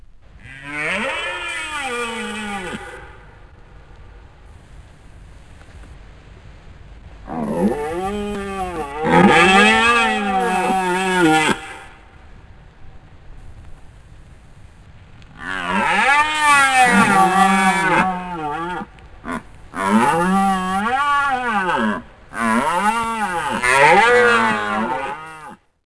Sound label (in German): hirsch; jagdlehr